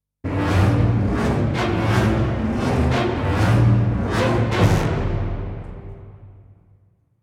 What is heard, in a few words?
Epic Orchestral Stinger